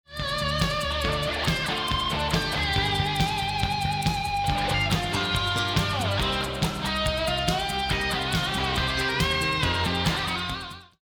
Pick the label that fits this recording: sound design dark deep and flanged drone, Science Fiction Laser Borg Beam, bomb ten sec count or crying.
bomb ten sec count